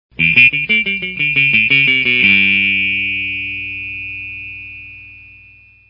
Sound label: ring